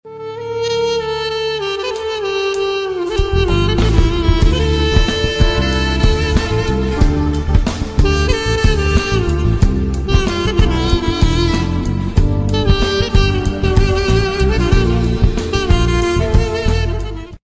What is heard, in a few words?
Ringtone